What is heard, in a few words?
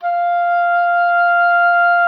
OBOE1, F5; Xsample VOL-6